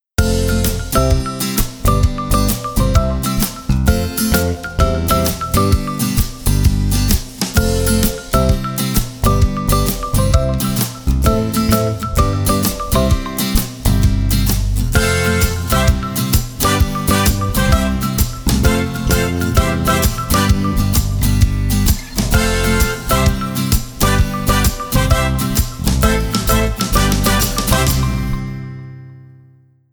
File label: Shuffle